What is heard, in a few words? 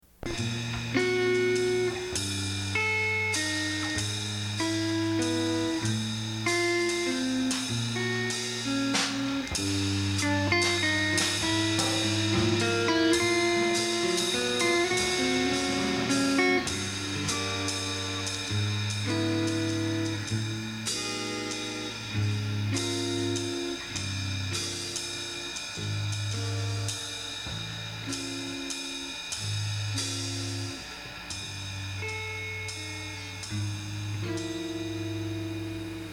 Short Ballad; Box Factory Tape